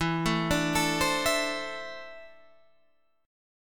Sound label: E 7th Suspended 4th Sharp 5th; E7sus4#5 arpeggiated; 12,12,12,14,13,12; JGuitar